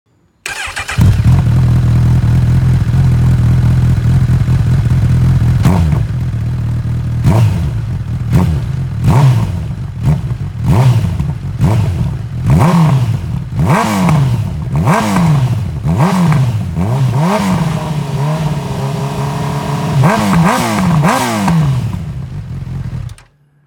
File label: Soundfile CB1000R, Exhaust system; HONDA; 2018-2024; BODIS MGPX2 Stainless Steel Black 2,65 kg